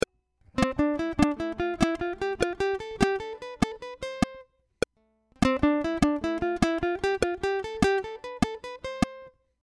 C Escala Tresillo Click

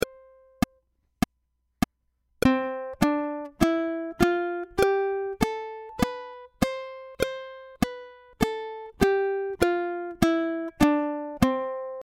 C Escala Despacio Back Click

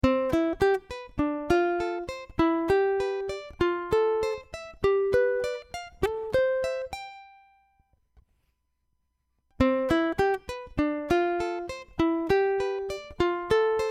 C Escala

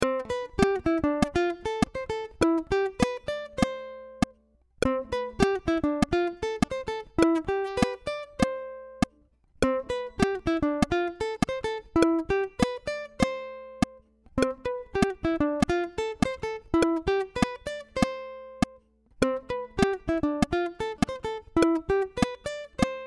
C Melodia Ejercicio Solo Click

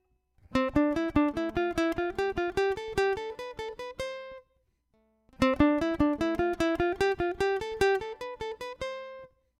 C Escala Tresillo

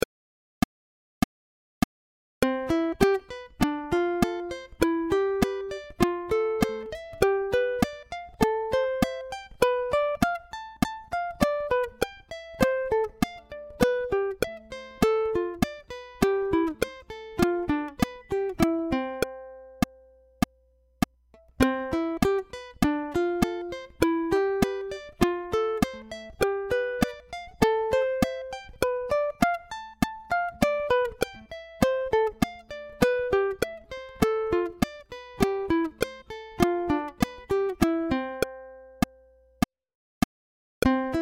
C Escala Back Click

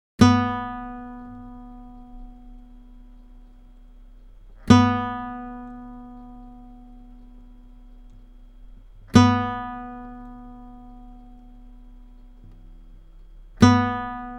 Guitarra Converitida

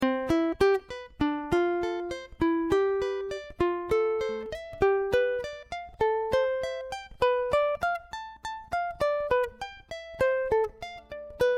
C Escala Back

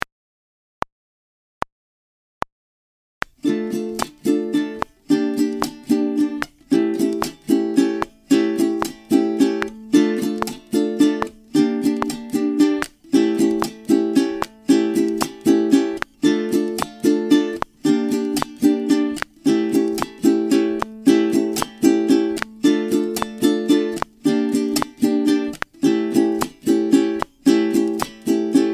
Ritmo Joropo 75 D

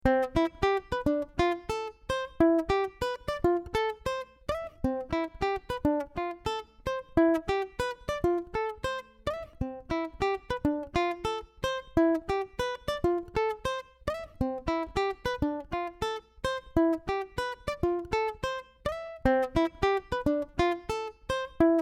Melodia Ejercicio Solo

